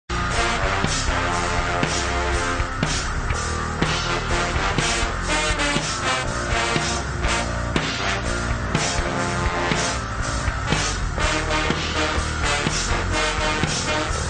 ska

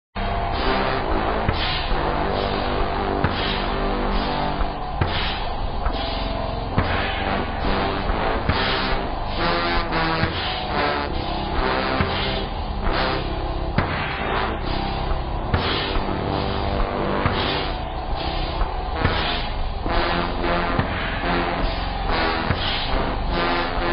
ska; vapesquared

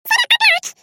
clock

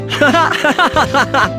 laugh

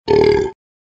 burp

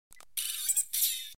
meep meep

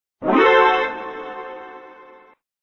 alert

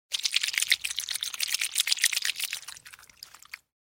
schlop

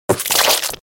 splat

